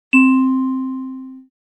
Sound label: Marimba